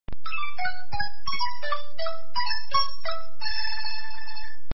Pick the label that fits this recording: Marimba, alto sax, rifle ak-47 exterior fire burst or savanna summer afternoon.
Marimba